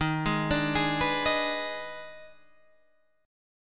Eb Major 7th Suspended 4th Sharp 5th, Guitar Standard; arpeggiated; chord; JGuitar